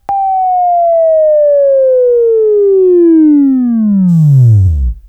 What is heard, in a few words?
Buzz, Sweeping Training